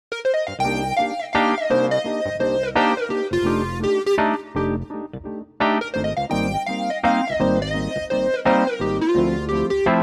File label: 2600 Pulse 50; dexibell vivo s2